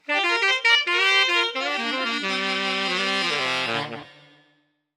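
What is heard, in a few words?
Tenor Sax, Harmonized Reverb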